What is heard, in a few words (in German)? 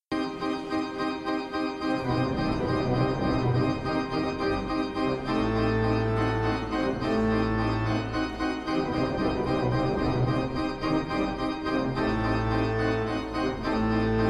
ballade, orgel solo; burgmüller